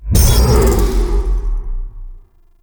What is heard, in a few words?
UI Valance Mod Activate; Mods